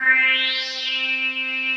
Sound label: PPG, Analog; USB Soundscan vol.49; Analog Fantasies; AKAI